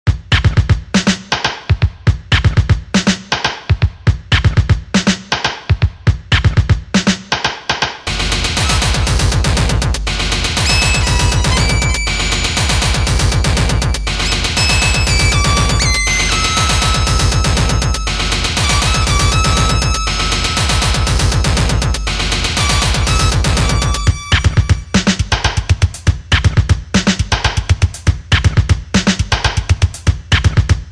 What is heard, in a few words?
Beat Suite; Instrumental